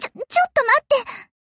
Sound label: Breeze tap